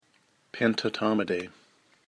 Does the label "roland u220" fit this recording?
No